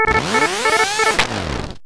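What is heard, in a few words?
Failure High Priority, alarms; J Edworthy 2019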